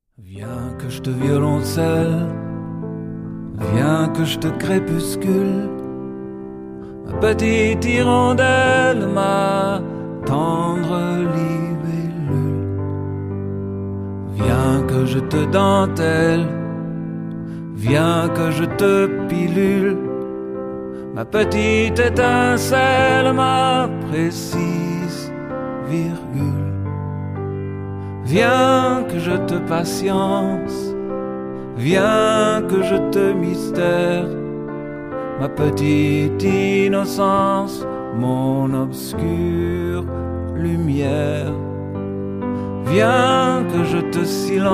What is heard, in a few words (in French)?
Violoncelle